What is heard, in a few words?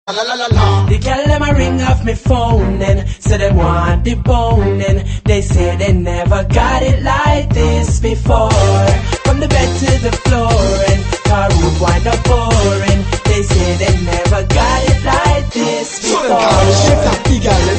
ragga ring; reggae